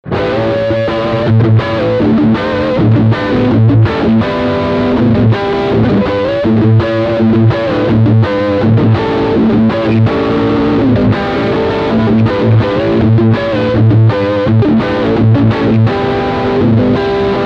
Riff beide Pickups

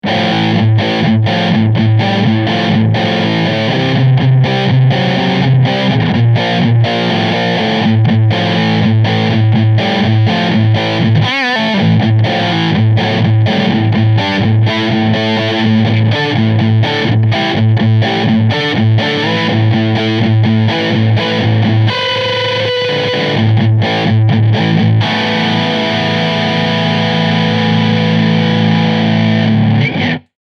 Double Rec Trocken

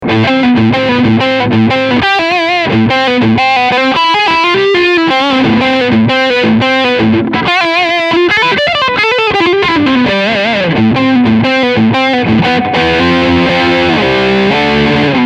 Riff Steg Humbucker

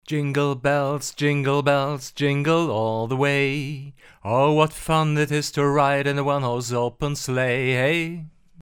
vergleich rode broadcaster gesang

